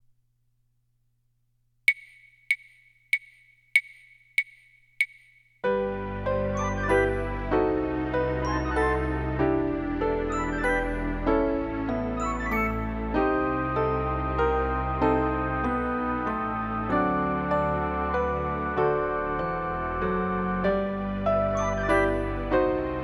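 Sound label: solo with accompaniment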